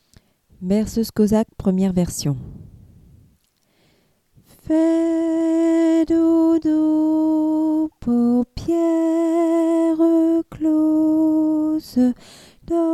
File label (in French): berceuse cosaque version alto